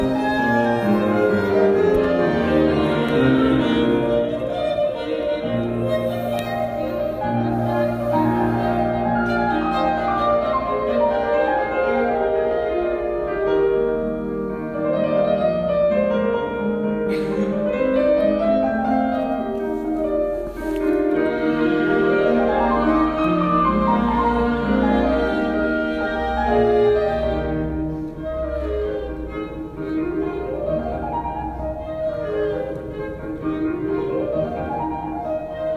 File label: Concert Motzart Kegelstatt Trio; Ravenna